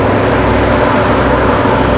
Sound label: run